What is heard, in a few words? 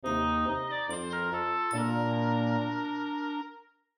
Childrens music